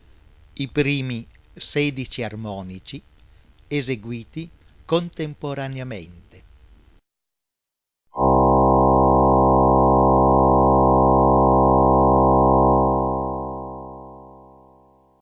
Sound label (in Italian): I 16 armonici assieme